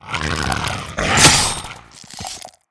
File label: giant scorpion attack, monster